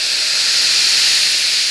health low pitch loop

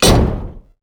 connect sheet metal

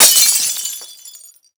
Amb Destruction; Red Orchestra Ostfront 41-45

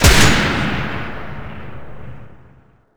turret cannon 1shot

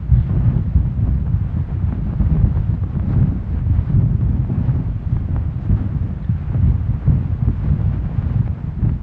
explosive objects; World of Tanks

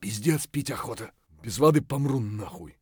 dehydrated; Escape from Tarkov

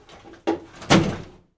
Vehicle Hatches; Red Orchestra 41-45

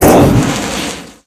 fire pipe burst; Explosions

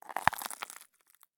walk glass; Escape from Tarkov

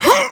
vce effort large

